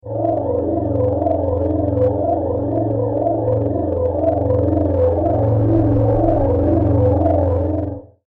Future Forcefield, science fiction sound effect; star wars / trek style

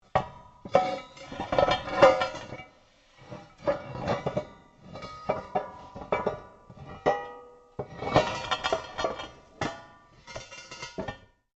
METAL ON METAL LARGE MOVE; Metal (Sound Effects:Materials)

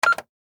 Office Fax Machine Press Button Beep; Fax Machines; Electronics

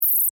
Insect Voice Chirp, Vocalization, Bug; Animal

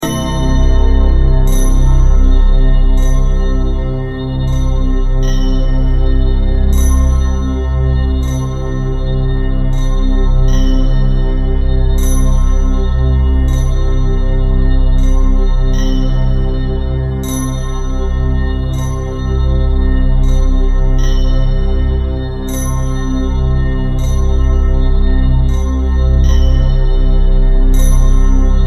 Synth, Stab, Drone, Horror, 5.1; Chimes, Creepy

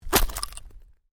Ice impact lite, Ice: light impact with debris; Snow; Weather; Stuart Duffield

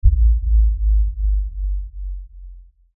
Lfe Pulse Low Bass; Blastwave; Sound Design

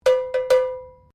HORSE BELL FORGED RINGING

